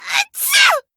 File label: sneeze; toko